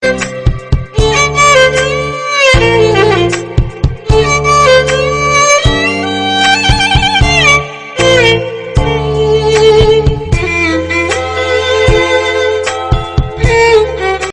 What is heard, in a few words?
Love Story Violin; Pyar Vali Love Story